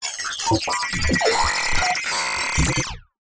science fiction cyberpunk computer equipment or machine chirps beeps glitches; zapsplat